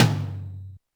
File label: Yamaha RM 50 Toms